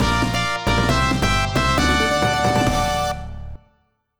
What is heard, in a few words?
1UP Jingle; Sonic Robo Blast; The Sounds of Robo Jam; SAGE 2020